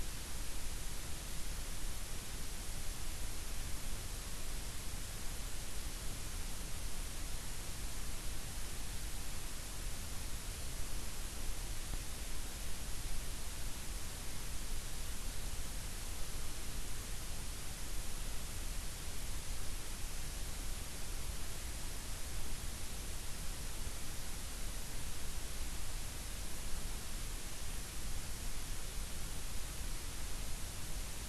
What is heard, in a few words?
WFR audio emfisis